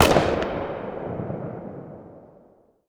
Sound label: aug distant; weapons; Counter Strike Model Pack; CS:GO